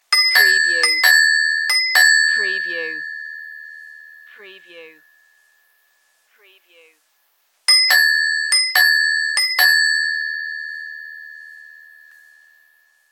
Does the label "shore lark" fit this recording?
No